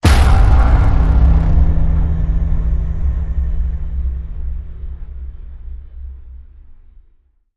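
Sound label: Hit Horror; Hit Hor Pine Box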